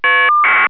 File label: Caller ID FSK